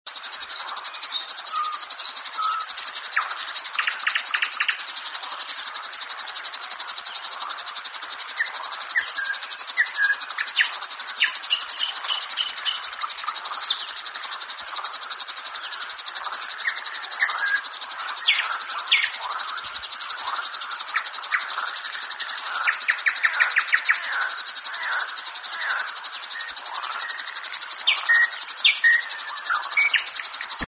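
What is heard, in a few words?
river warbler, nightingale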